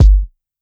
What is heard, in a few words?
Kick Hitter